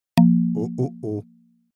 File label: wrong